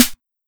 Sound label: sizzly snare drum sound b key; snare drum samples